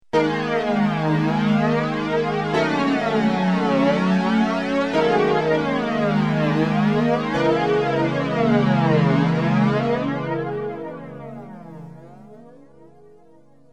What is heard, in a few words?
m1000 sbf325